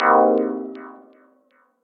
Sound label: Minor 7, Synth Keys; Mister